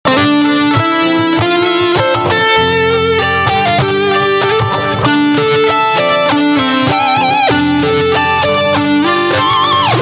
Ibanez JEM7V EHX Slamm Metal Muff Stereo Memory Man Roland JC120 x2